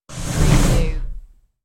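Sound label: Dresser Drawer Shut; Sounds in the home of drawers being open or shut.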